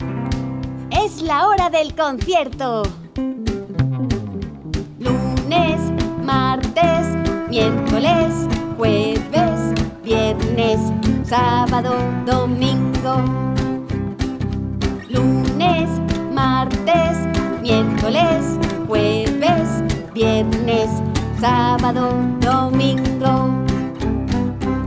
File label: song spanish